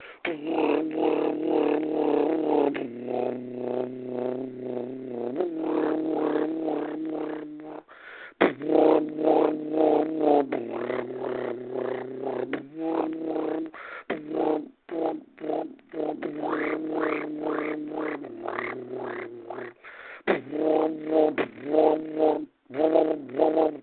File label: время битбоксить, time to beatbox